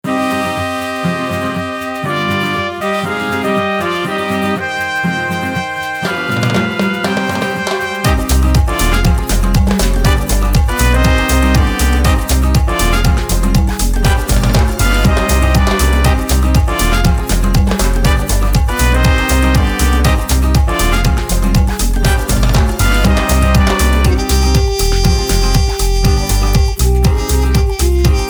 electro cuban

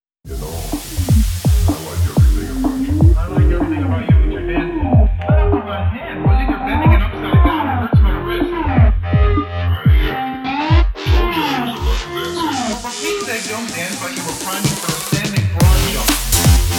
bass house; ancore sounds

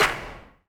flaming pistol gun shot bang

